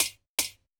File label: Uh-1H spark plugs, aircraft; DCS